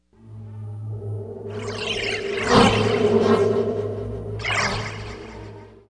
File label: Shadow Drone; Babylon 5